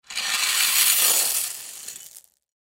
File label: Gravel Movement Metal Rake Pebbles; Foley; Rocks; Materials